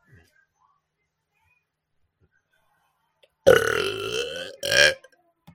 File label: weird burping